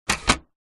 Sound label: Epson inkjet printer paper tray push in